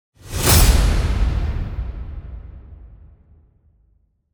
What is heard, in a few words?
IMPACT